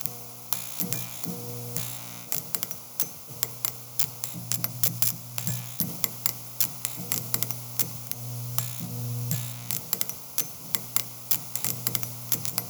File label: Light Flicker Loop; Ambience; Props; Warframe; Nokko Colony